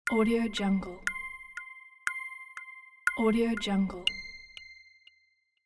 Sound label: game start countdown